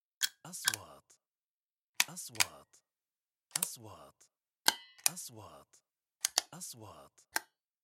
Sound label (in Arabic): نغمة كليك قطع; transitions